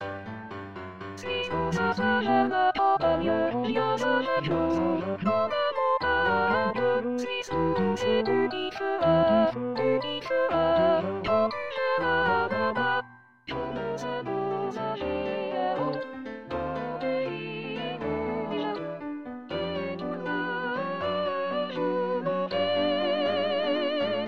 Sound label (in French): Soprano; CARMEN La liberte